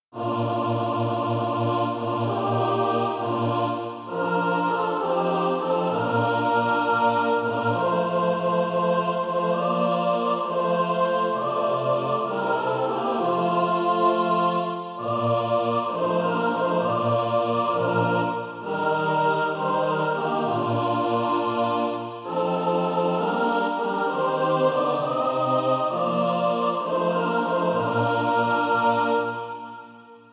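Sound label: mixed, SATB Practise Music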